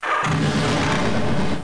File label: Ignition; funrun